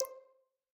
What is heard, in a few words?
Click Table; MiSide